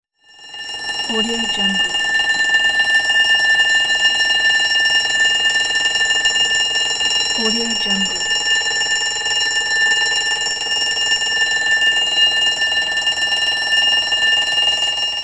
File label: alarm clock